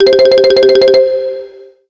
Classic, ringtones; Realme 8

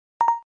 Auto Focusing, Event sounds; LG KF900 Prada II

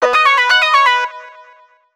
Droid alert; Sony Ericsson; PlusFourSix; SE Sound Project; Lars Edvall

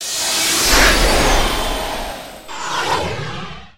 shut down music, phone; HP; iPAQ Glisten; ATT

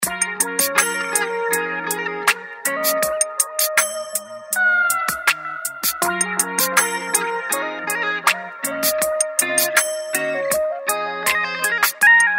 Slowly; Samsung; GT-S5320

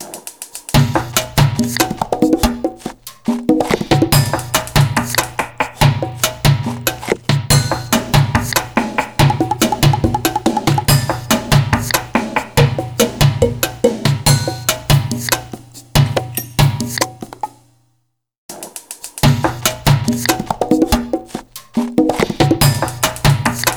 Kitchen Ensemble, ringtones; LG Smart Folder X100S

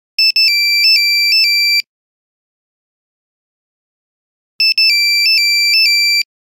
Nokia Buzzer City bird; Windows Phone apps

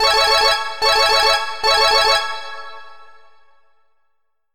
tone; Kyocera; DuraXV-LTE

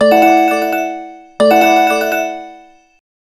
ringtones; Magnolia; HTC One M9

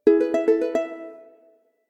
Ukulele

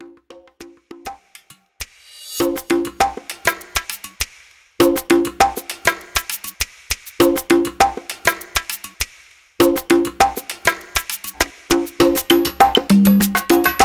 Percussion, ringtone; LG L20 D105f

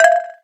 electric etc, keytone; SKY IM-A730S-VEGA-S

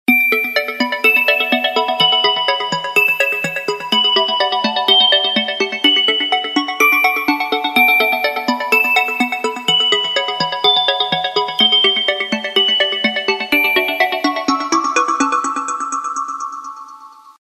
Late Night; Samsung; SGH-U800F SoulB

